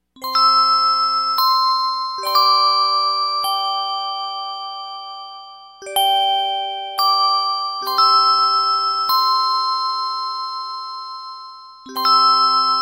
westminster chimes ringtone

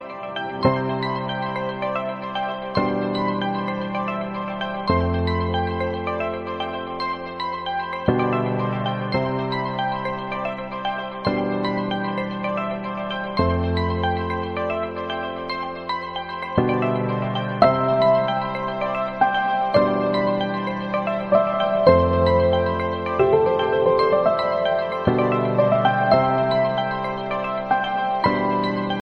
snow flake calm ringtone

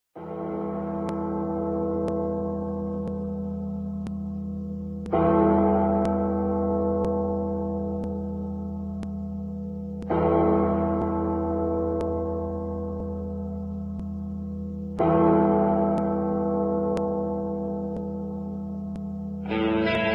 rock ringtone; iphone 3g s

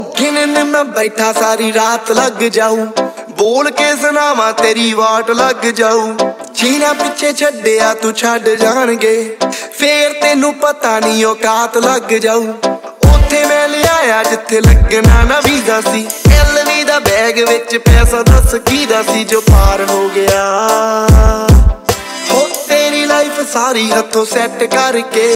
new punjabi ringtone

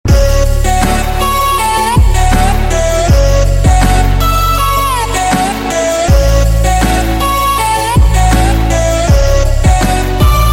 cool ringtones ringtone

